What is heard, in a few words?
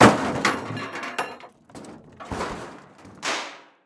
crash metal debris drop wood rods sheet metal movement settle; a dump in the dark